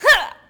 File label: Boxing Female Punch Breath